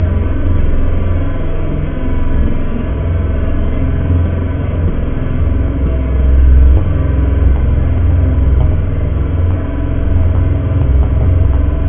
Broken Voices; Synthetic's Serum Collection; Chill Trap 2 Tables

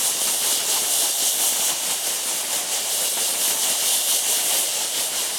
Atmos; Synthetic's Serum Collection

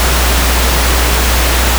Organ Noise

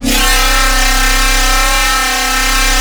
Pipe, Noise; Cymatics; Shapeshift

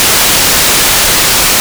ARP white, Noises, Analog

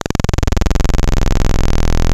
PWM; Analog; Synthetic's Serum Collection; MedicineHat

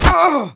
death, player; Team Fortress 2

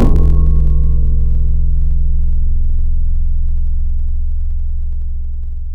Hit, Tropical Mallets; Synthetic's Serum Collection; SparkPackers

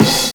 soul crash, Breaks (Slices); 'Effection' samples; soulcrashes; lolicore

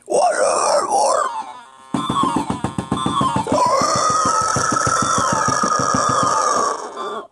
SYNTH AND SNARE; lolicore

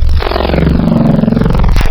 Wavetable Hippo; Synthetic's Serum Collection; Audeka Wavetables

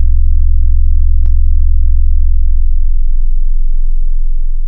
Digital Chill 808; Wavetables; Synthetic's Serum Collection; ESW Core Wavetables; Echo Sound Works Core Tables

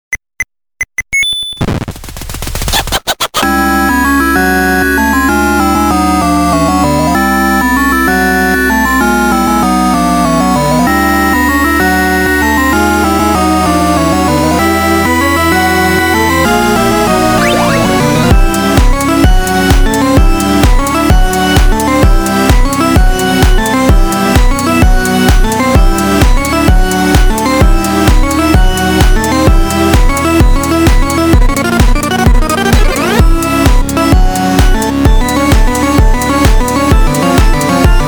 Zombie theme (Chiptune House Version); lolicore

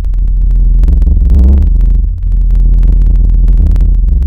Scraped, Tables; Synthetic's Serum Collection; Chill Trap Tables

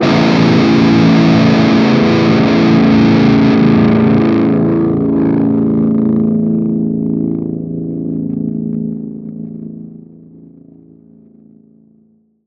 guitar drop A chord A-1; Noise Sources

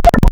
Sawtoothed Mess, Serum Wavetables; Synthetic's Serum Collection; Cymatics - Nuclear for Xfer Serum Wavetables

